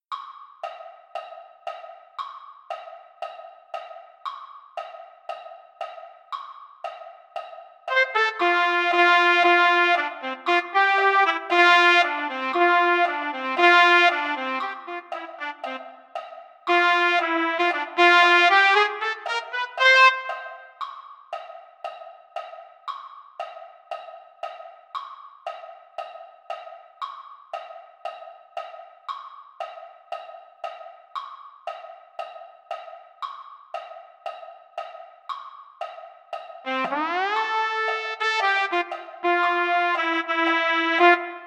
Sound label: trumpet, brass; patriotic brass